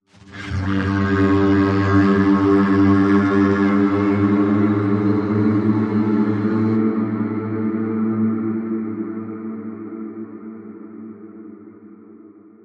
Beastly Throat Bend

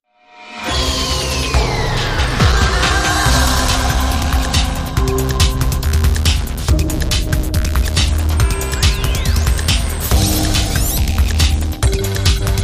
Electro Tech Ramp Sweep

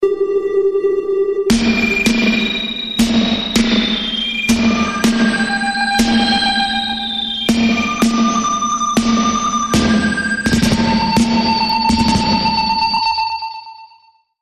Sonar March Sci Fi Bumper

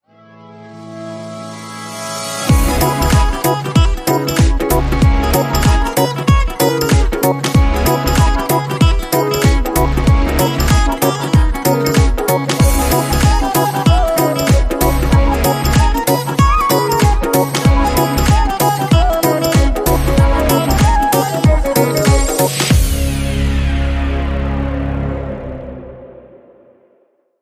Tabla Timba, Short

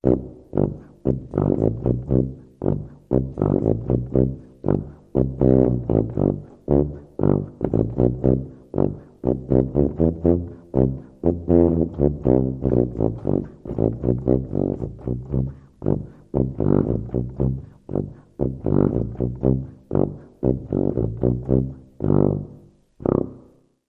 Tuba Oom Pah March

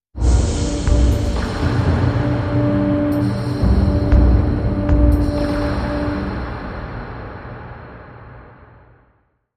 Beat Sting

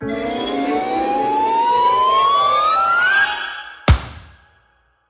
Slide Whistle

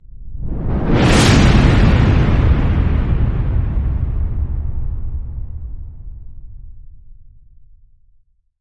Whoosh

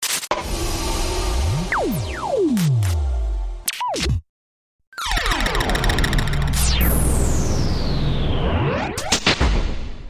Clean Sweep

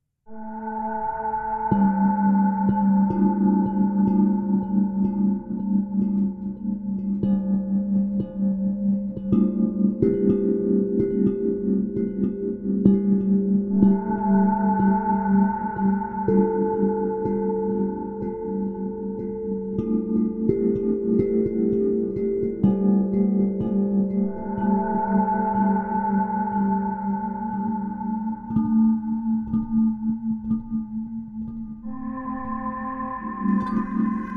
Ambient Hush, Reduced

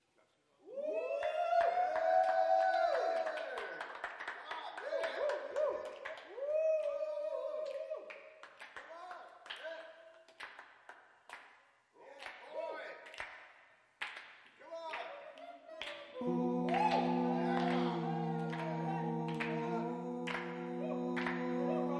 Voices And Jazz Club

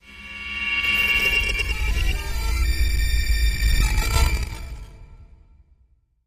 Energy Fluctuation

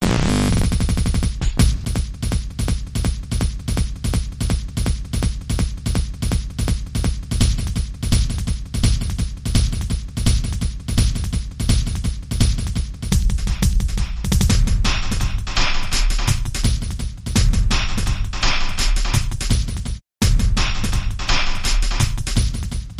Beats In Progress Strong Percussive Solo

